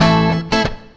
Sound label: FUNKY, Grooves